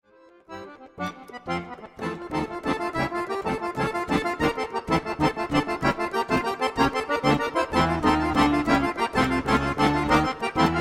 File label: accordion; zzmusic